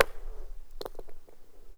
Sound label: ice bang; Sound Design Tools; Raw Foley